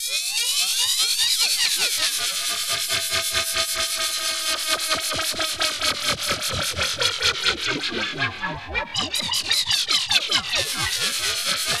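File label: synth, Drum & Bass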